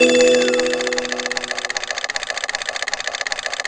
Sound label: Win; MGSokoban; Dark Dimensions: City of Fog